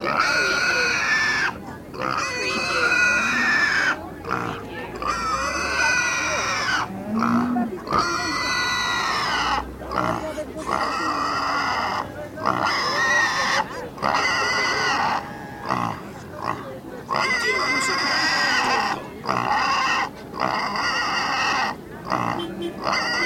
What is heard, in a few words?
pig market